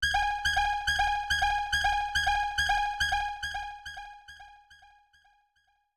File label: Warning; treasure conflix; snes